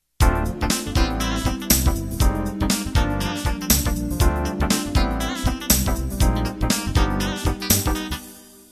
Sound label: Groove Intro